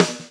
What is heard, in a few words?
Snare